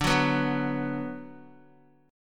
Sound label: D 6th D down Guitar, chord; D6 strummed; JGuitar